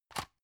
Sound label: drug open hard; arzt